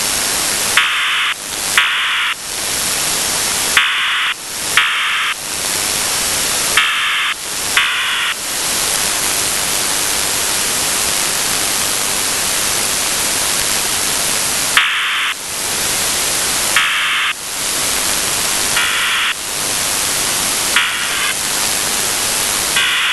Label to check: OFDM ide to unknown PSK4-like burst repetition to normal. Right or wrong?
Right